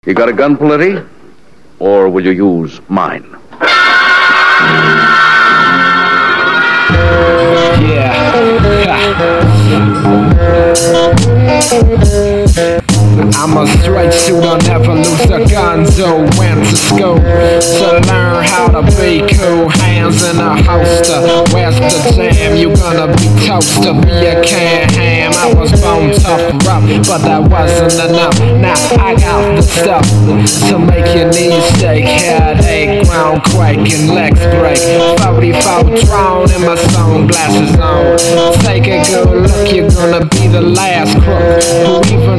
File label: Old Western Firefight